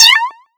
Cute Creature Warning You

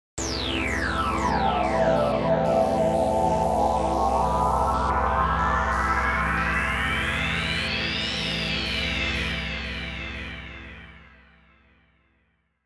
Big Intro A minor

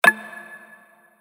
Confirm Sound

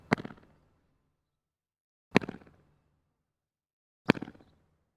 Foot Stomp

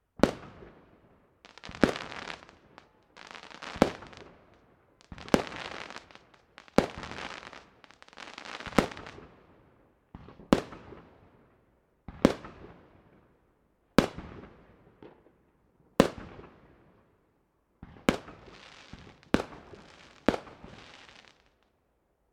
Loud bangs Fireworks

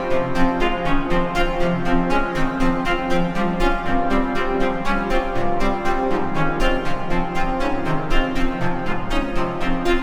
String Loop Wider Longer Dischordant

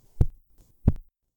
Footstep Slow

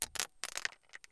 Rockfall

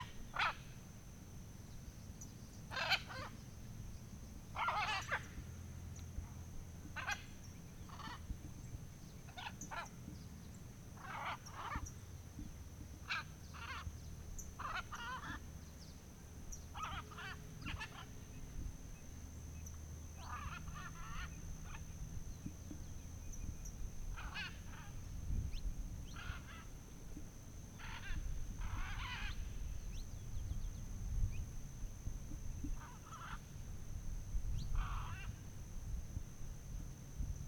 Macaws At Dawn Fazenda In Goi S Brazil

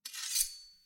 Sword Unsheath

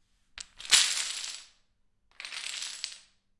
Jenga Blocks Falling

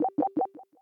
Searching

